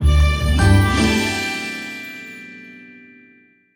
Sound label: Win Sting; pooplers